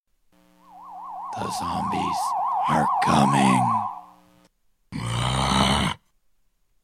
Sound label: Zombie Message